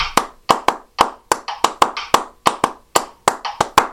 reversed clapped

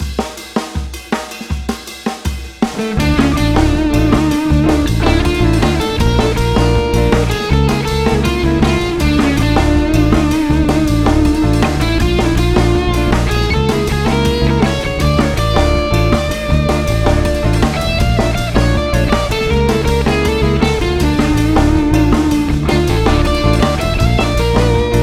double harmonic minor

